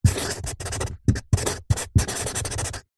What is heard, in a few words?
office marker write on empty cardboard box